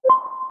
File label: Error